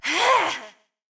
Attack Vo; portal quest; android; Mother Nature

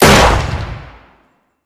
Gun Fire Outside; The Town

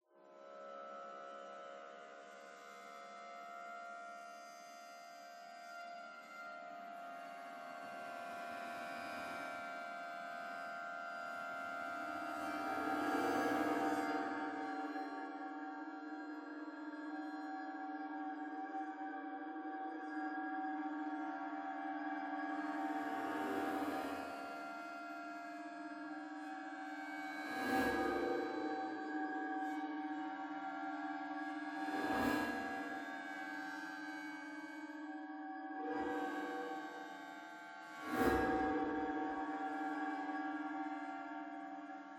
Cave Atmos

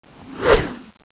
Whoosh; Marble Blast Gold

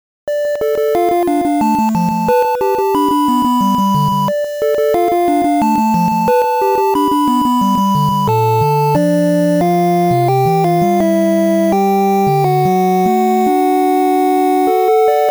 Ocarina, Serenade, 8bit; The Legend of Zelda: Ocarina of Time

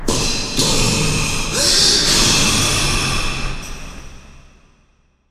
Gas Attack; 1916 der unbekannte krieg gamerip

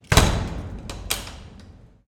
Tortures Freezer Room; This Is the Police 2

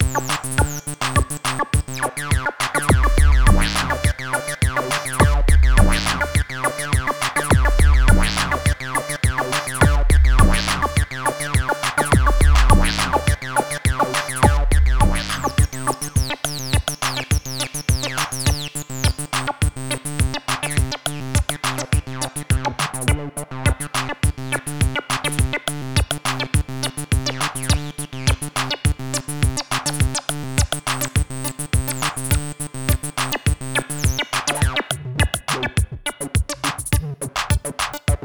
Rising beat; Shortest Trip to Earth

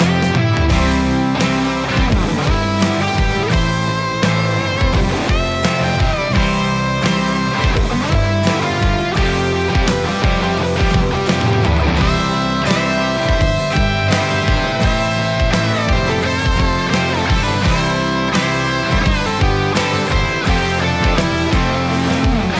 Country Anthem Loop Start

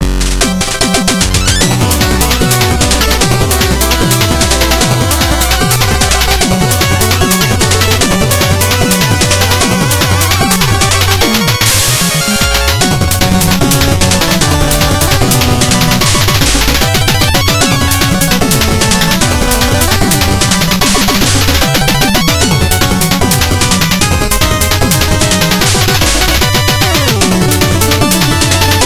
Music Boss Propeller; Shovel Knight King of Cards

